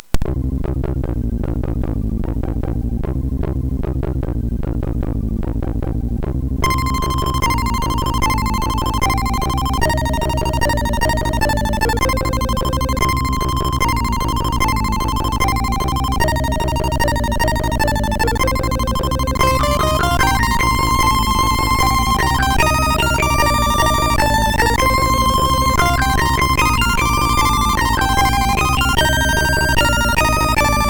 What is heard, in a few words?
Multivoice 2, MOS6581R4; hvsc